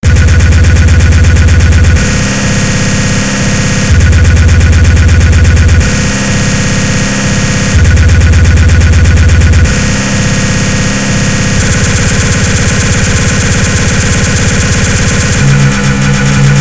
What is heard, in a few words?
Cowbell